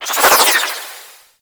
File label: Arch Wing Prime Dodge Roll Left; Movement; Warframe; Vehicles